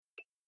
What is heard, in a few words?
camera countdown timer; Sharp AQUOS sense10 SH-M33